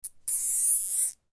zips

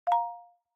out sms, phone

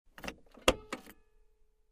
bag open

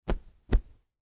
clothes backup

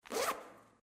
backpack close

